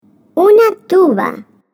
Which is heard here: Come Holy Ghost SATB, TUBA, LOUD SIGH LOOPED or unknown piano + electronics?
TUBA